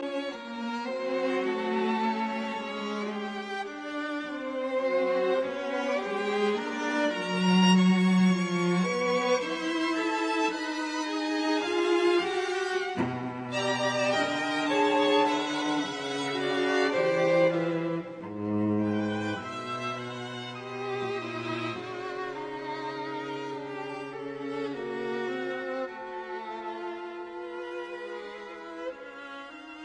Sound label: String Quartet Lento Allegro; Crawford